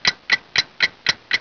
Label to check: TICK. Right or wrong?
Right